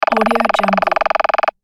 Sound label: woodpecker